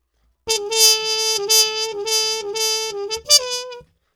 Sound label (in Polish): trabka